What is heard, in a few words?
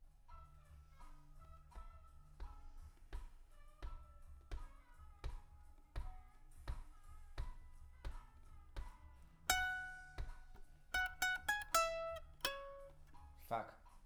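guitar Mic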